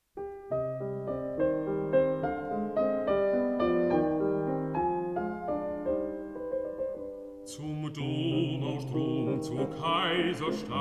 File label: song for voice & piano